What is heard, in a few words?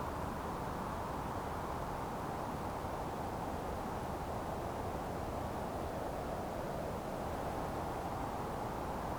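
sand wind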